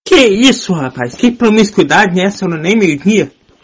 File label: mmmmm